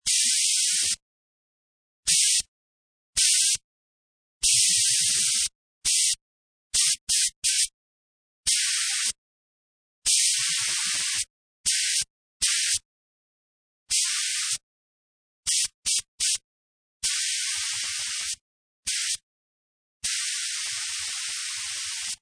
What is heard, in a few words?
Paint Spray Gun Several, Aerosol, paint spray can, spray paint, painting a piece of bodywork; several short bursts; Spraying; Lynne Music